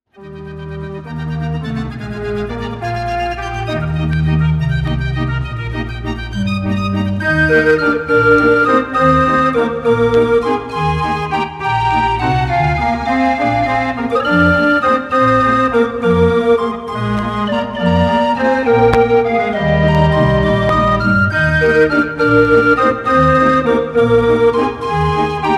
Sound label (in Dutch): draaiorgel; Carl Frei; Grote Dorus; Wolvega